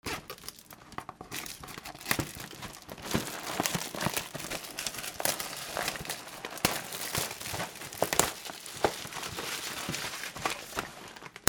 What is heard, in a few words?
FRICTION